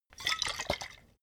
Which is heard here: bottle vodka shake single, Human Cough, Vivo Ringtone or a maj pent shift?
bottle vodka shake single